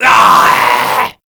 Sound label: Infested Runner Attack Call; Warframe; Runners